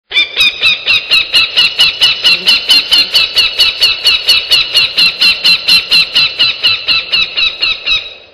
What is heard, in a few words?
osprey